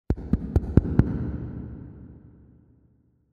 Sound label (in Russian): postukivanija s jehom